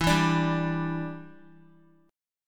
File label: E Minor 7th, down, Guitar; Em7; strummed